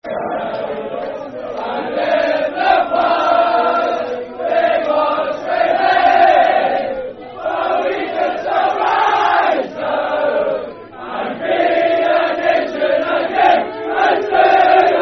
To Be A Nation Again, Football Chant (Soccer Song), N. Ireland